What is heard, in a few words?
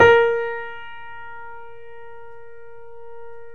PIA GRND, Roland; Sound & Vision; Gigapack I CD 2; PIA GRAND 2; PIA Grand 6